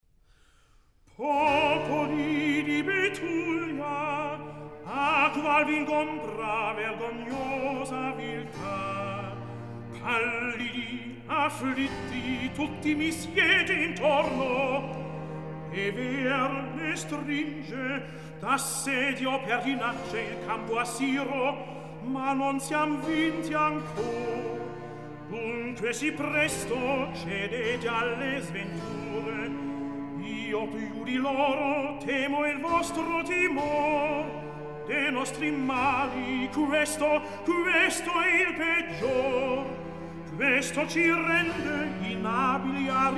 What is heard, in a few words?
Recitativo; Mozart; Oratorios, Cantatas and Masonic Music; La Betulia liberata